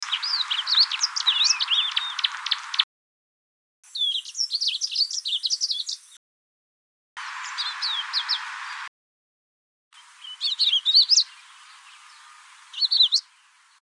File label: seedeaters compilation; Forêt du Day, Djibouti; four different recordings separated by 1 second silences